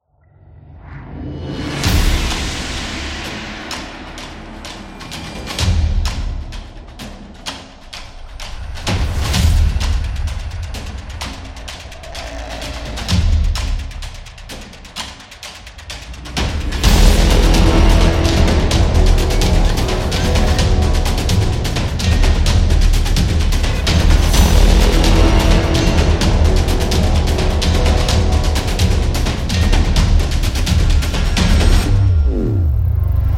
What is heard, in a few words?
Epic Drum War; monster attack